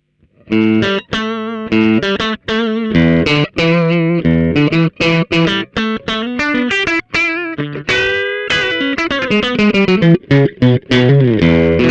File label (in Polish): Fen Czysty M, humbucker; classic strat humbucker